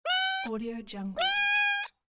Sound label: cat